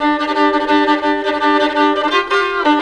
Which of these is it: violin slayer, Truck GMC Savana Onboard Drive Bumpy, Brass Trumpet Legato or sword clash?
violin slayer